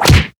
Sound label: hammer hit; ddnet